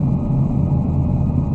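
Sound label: Ambient Loop; 3D Scifi Kit Starter Kit HD; Raumschiff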